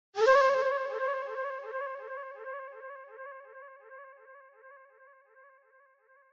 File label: flute